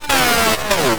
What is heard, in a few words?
Miscellaneous Glitch